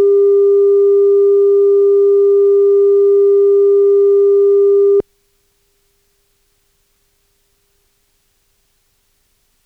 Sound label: 0dB 315Hz